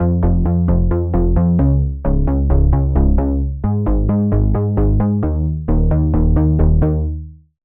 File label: Bass; Routed Sound; Spire Expansion - Reality Maker Vol.1